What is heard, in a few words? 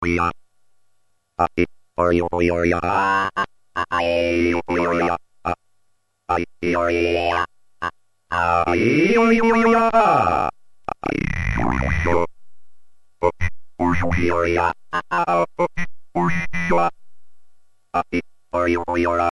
Talking Synth; Flame MIDI